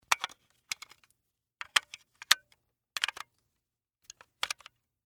Batteries Insert, Technology, Into, Charger, Aa, Battery; Miscellaneous Electronic